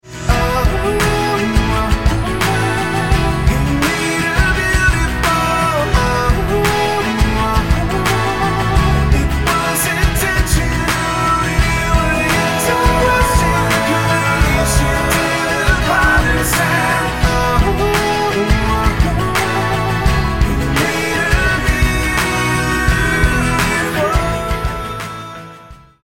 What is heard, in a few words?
ringtone